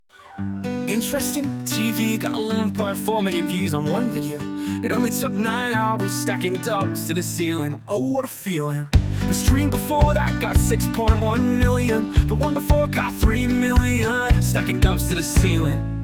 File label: emotional rock